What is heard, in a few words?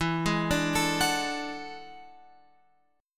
E 11th, strummed